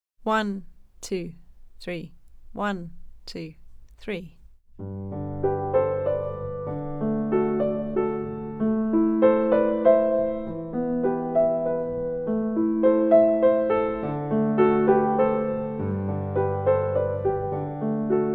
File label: Open String Waltz backing, violin